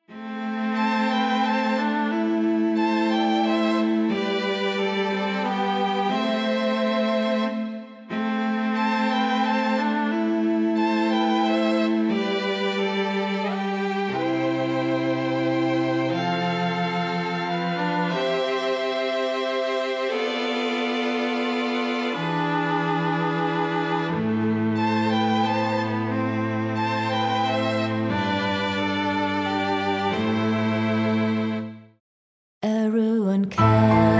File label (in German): Streichquartett